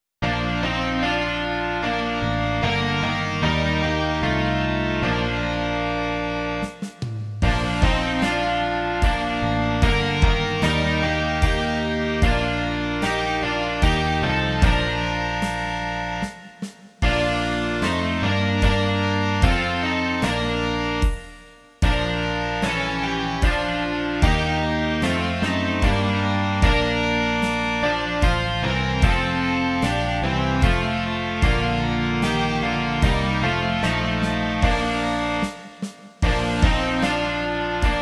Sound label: rock